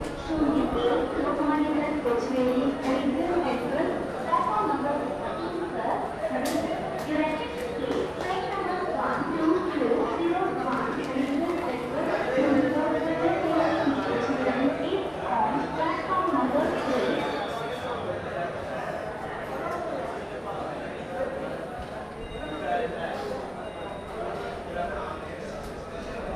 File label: train station, South India street recordings